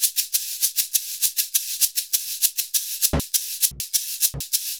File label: SHAKER, Modern Percussion Loops; Soundscan vol.56; AKAI; SHAKER100